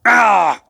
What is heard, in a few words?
battle wound